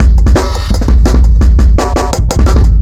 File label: Big Up Kit 2-Bar, Drum n Bass Beats; KJ Sawka; Drum n Bass & Beyond